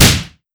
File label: fire, weapons; gesource; rcp90